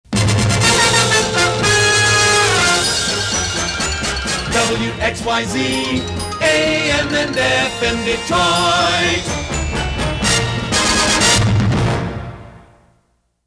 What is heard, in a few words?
WXYZ AM FM PAMS ID Detroit